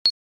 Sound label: Digital beep